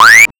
Star Instruments Synare PS-1

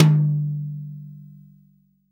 TOM, REAL DRUMS, SWING KIT

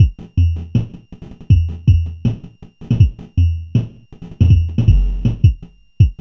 MUTED; E-MU Producer Series Vol. 6 – Analogia Project

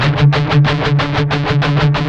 16TH D#3 L, Guitars; Spectrasonics; Hans Zimmer Guitars VOL-1; MED 16TH

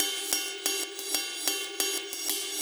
Cymbol Particle; Particles

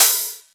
Open HH, Cymbals

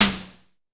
Drum X Snare; Pearl Drum-X

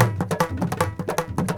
INDIA R, Heart of Asia, IND ENS

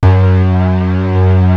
DEEP; BASS SOUNDS

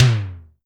SIMMONS SD-5 drums

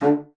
D3, bassoon; Xsample VOL-6; C BASSOON

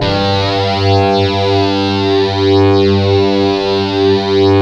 stick, Chapman Stick; E-MU Formula 4000 Series Vol. 3 – Analog Odyssey

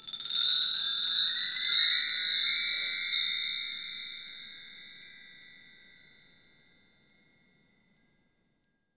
MARK TREE 3, WIND CHIME; AKAI S-Series CD-ROM Sound Library VOL-5

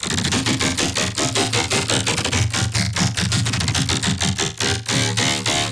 D 210Bpm Laughing Bass

